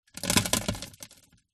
dirt drop onto empty plastic box inside perspective